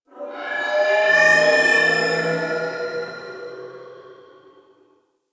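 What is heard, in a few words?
Gas Distant Energy One Off; Ambience; Warframe; Corpus Gas City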